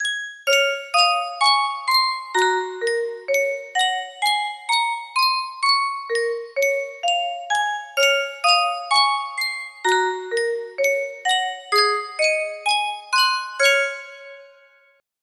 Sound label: music box, breath of dawn